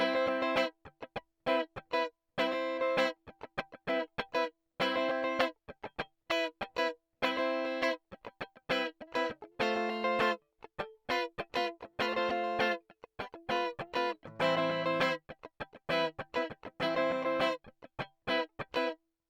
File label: Guitar Rythm Lowshelf -10dB 400Hz